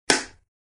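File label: Film clapperboard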